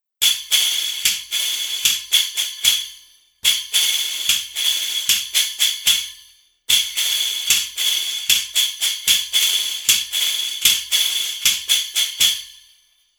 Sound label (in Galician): charrasco